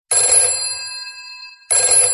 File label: trin trin